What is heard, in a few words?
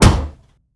Aircrafts Engines Packard Merlin Prop; DCS